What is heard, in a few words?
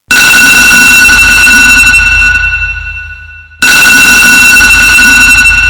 ALARM; memes